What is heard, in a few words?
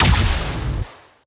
Gunartl Rocket Launcher Fire